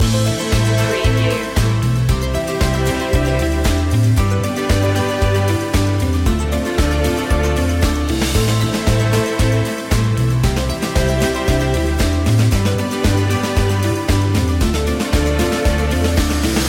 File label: corporate/electronica track starts with a retro sounding; corporate business